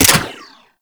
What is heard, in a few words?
Archwing Mach Gun Dry Fire; Warframe